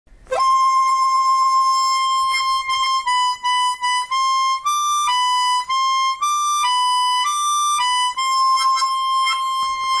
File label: Harmonica song